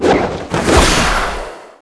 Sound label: Wind skill